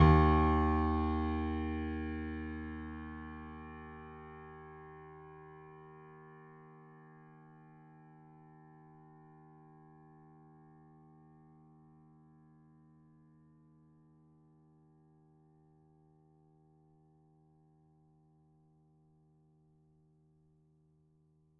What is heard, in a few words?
D#2, Grand Piano; bitKlavier Grand; Piano Bar